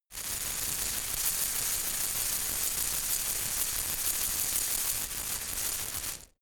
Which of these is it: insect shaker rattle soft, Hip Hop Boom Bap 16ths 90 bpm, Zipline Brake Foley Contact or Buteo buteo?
insect shaker rattle soft